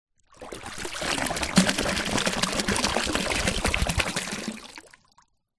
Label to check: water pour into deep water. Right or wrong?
Right